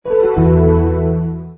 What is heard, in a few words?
gameover; crazy car